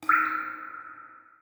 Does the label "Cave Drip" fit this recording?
Yes